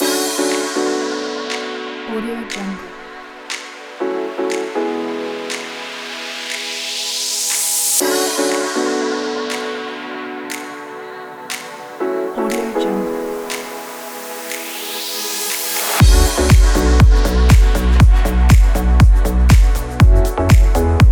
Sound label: deep house